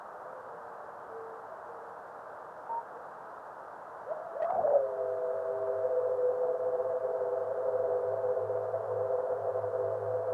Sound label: Fireball; Ashcraft